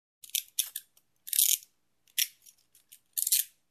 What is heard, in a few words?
Handcuffs clicking and locking